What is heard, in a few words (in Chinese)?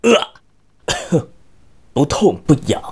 受击, BattleCry; 南宫